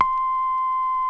vinyl beep

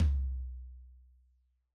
Yamaha DJX 2B, Jazz Tom

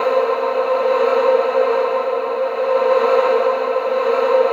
husky chord; Spectrasonic Distorted Reality 1

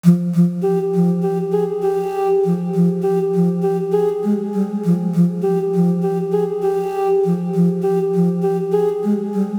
Flute 100BPM Fmin; Annapurna; 99Sounds x Monosounds; Instrument Loops

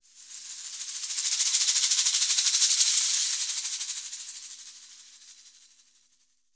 AMB SHAK, AMB PERCS; Univers Sons; Basicussions

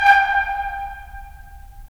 BRS, Tps Stacc; Roland - LA Composer vol. 4

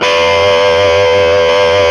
GTR, E.Guitar FX; Roland LCDP02 Guitar and Bass

